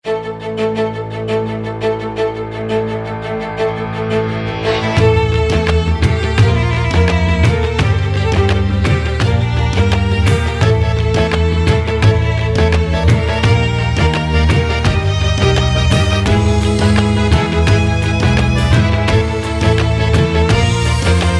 FUSION INDY JAZZ

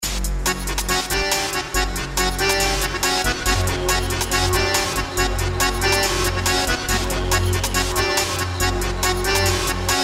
TUNING ACCORDS

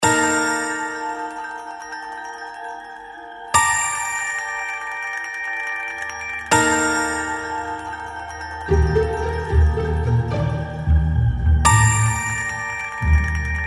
STRINGS AND WOODS IN THE FIELDS

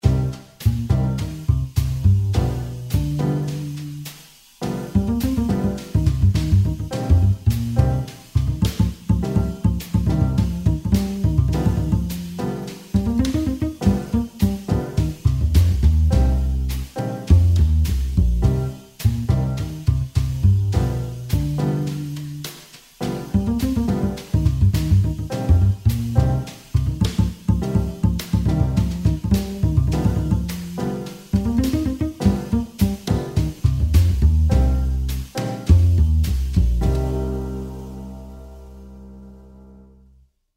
FUNKY JAZZ

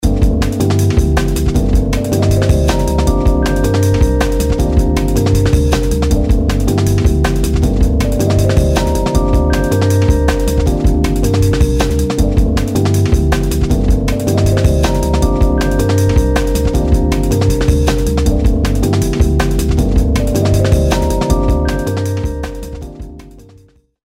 ELECTRO

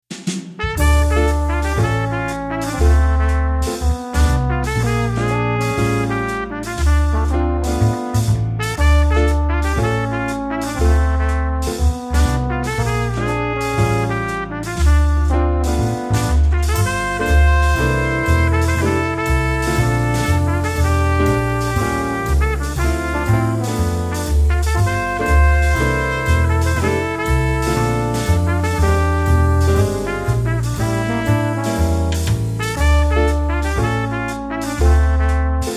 HARD BOP BLUES

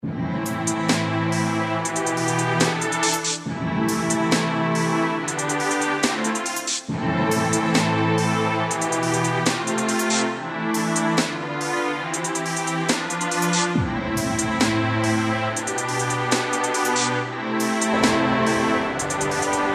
STRANGE STORY AMBIENCE

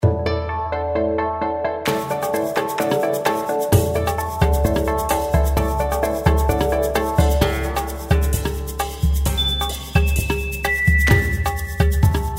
TUNING SECRET

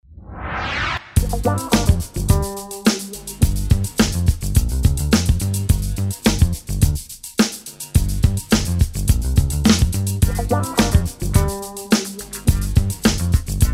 TUNING TEK

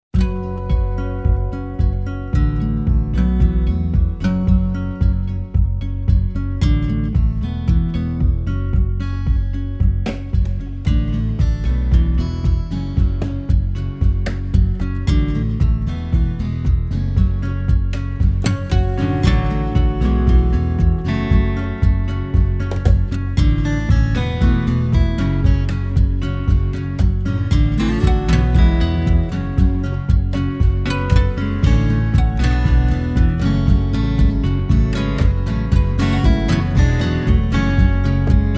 MUDDY GUITAR SWEAT

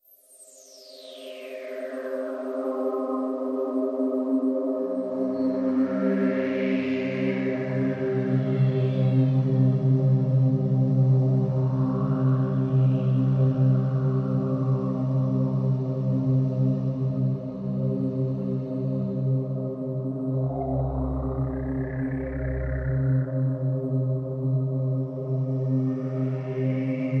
AMBIANCES

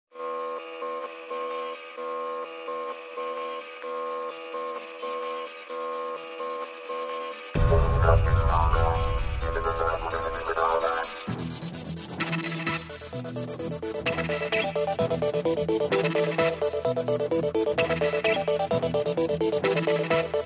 JT NEWS AMBIANCE

